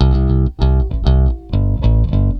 BASS, ROCK POP; MAGIX

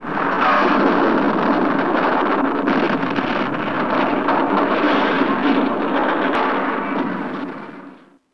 EXPLODE

